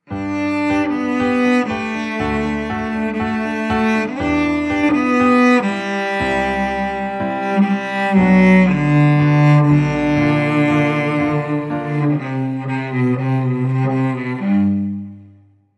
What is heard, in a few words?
cello